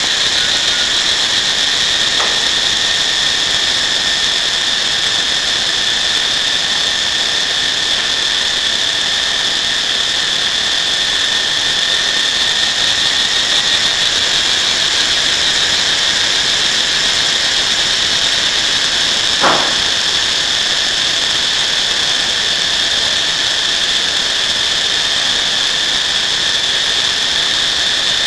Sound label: cicadas, vietnam